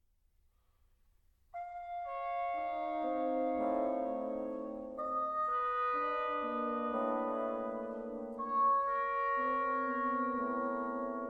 Brass Quintet

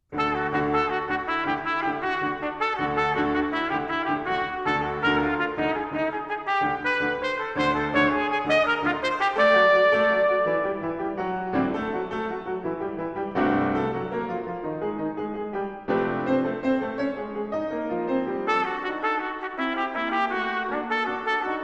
Sonata for Trumpet and Piano, Allegro

